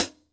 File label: HH CL, Drum 1 Dry; East Collexion